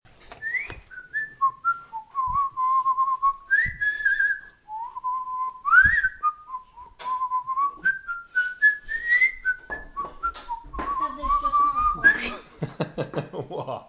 whistle

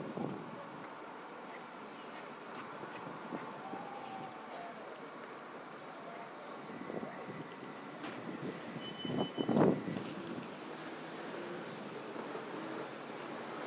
trains passing through west hampstead thameslink; uksm

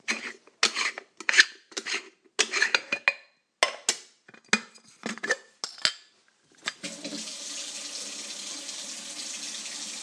monday morning soundscape kitchen

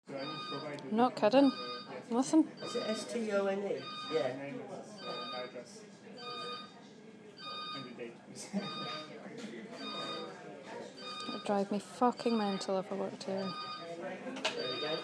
bank phone ringing

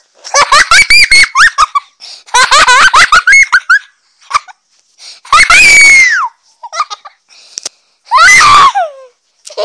me making the little one laugh

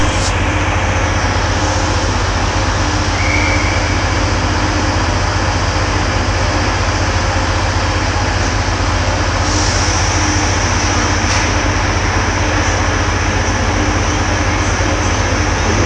liverpool train leaving leeds station

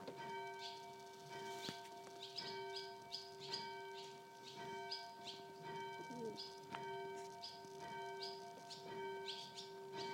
birds and bells

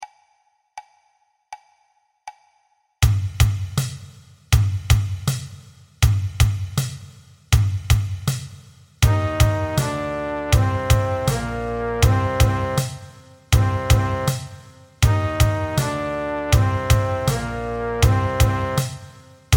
trumpet trombone

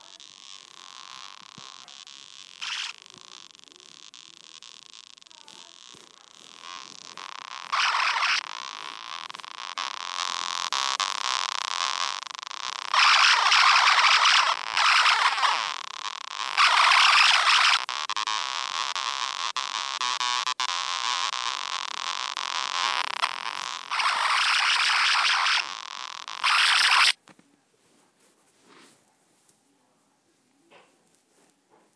circuit bent toy leopard

